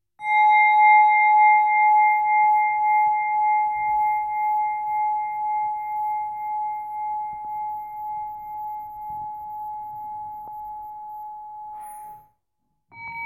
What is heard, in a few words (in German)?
Enso Klangschale